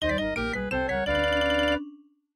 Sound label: MIDI Animation stings; Carmen Sandiego Junior Detective Edition